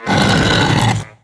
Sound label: amazon statue hit; DAoC